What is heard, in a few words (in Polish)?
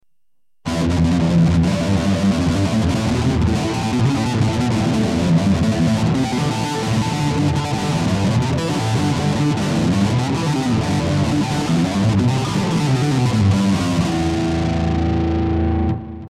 tlumienie lewa reka